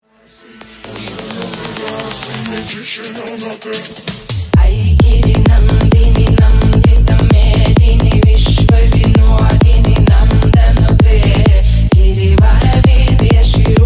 durga trance bass boosted ringtone remix aigiri nandini remix ringtone